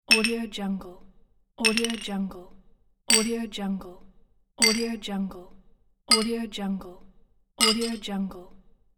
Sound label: glasses; sviridpavlov